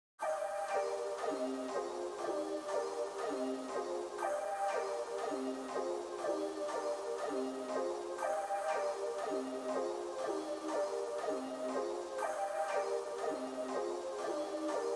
120 BPM G Min Synth; Loop Stems; Cymatics; 2022 Melody Collection Platinum Expansion - Pt 2; Raris

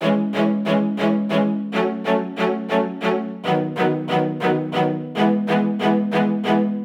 Moonlight String Loop 140 BPM E Maj, EDM; Instruments; String Loops; Cymatics; EDM Starter Pack

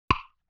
Temple Block Hit; Vintage Cartoon; Drums & Percussion

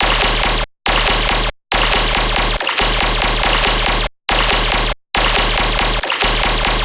Bass Loop D# 140BPM, Dubstep

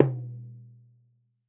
Frame Drum Small; World Percussion; Cymatics; Emperor World Percussion

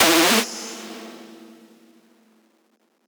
synth screech oneshot E; Synth Hits; GhostHack

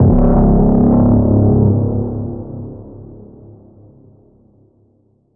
Impact

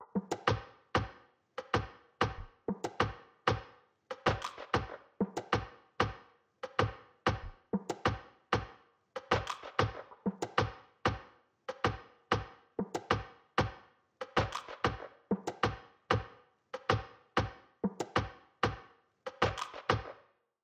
95 BPM F# Min Percussion, Latin; Cymatics; PARADOX II; Melody Collection; Amazon

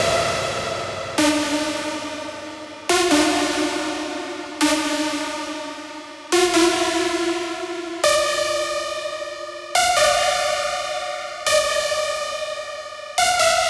Synthetic Lead Dm 140BPM, Techno; Rave; Melody Kits; Stems; Ghosthack; 7 Free Rave Melody Kits 2025

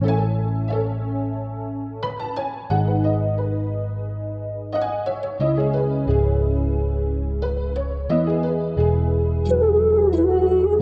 89 BPM C# Min, Melody; Cymatics; 2022 Melody Collection Platinum Expansion

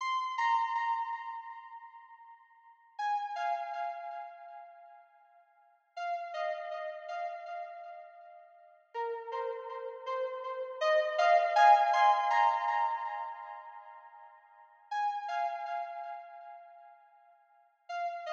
161 BPM F Min Lead; Cymatics; Obsession

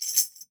Tambourine Medium; World Percussion; Tambourine Medium 1; Cymatics; Emperor World Percussion

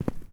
Footstep Dirt Heavy; Footsteps

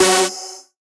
synth screech oneshot F# dry; Synth Hits; GhostHack

